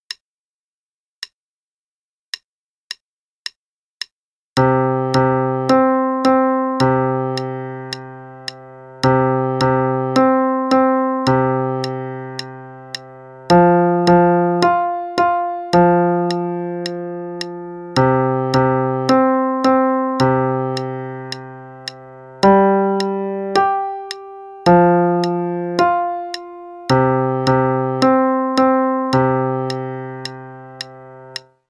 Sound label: Octave Blues, qn=108; Primer, Book B